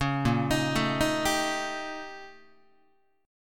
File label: Bb Minor Major 13th Bb, Guitar; BbmM13; arpeggiated; JGuitar